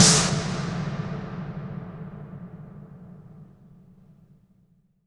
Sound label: IMP SNR DRUM, Drum, Cinematic Impact